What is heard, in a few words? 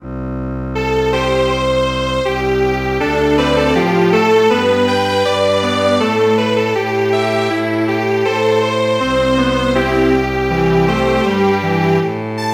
Strings Quartet; Cha puk